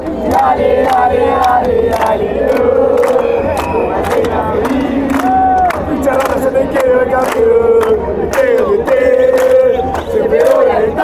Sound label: i would die without you, chants, argentina